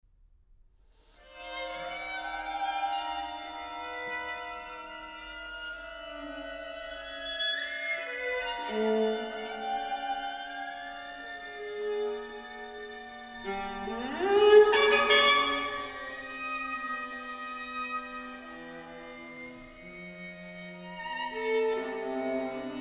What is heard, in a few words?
microludes for string quartet